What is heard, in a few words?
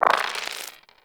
Roll Dice; Cetus Vendors; Five Fates